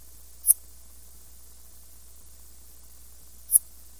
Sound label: Scudderia furcata; Arthropoda